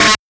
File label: breakbeat